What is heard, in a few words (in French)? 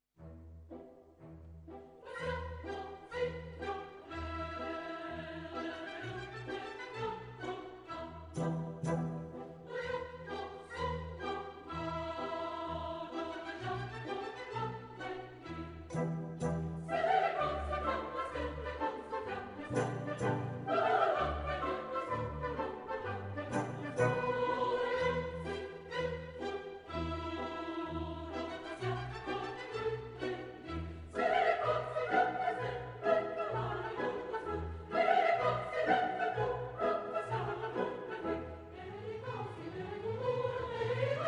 Choeur des bohemiennes